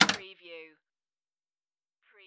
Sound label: Pinball sound, clank with recoil